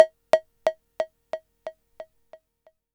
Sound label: tom, Korg Electribe ER-1